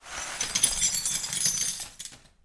cleaning glass parts

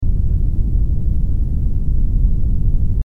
atmosphere

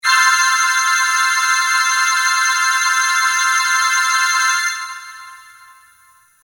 angel crying

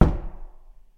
knock on door; knocking

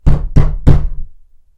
open the door; horror

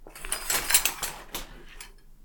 shower curtain

